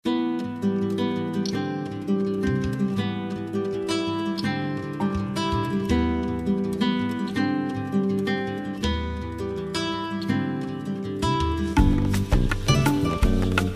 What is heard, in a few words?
Brazilian Cafe